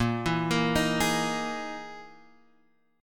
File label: E Major 7th Flat 5th chord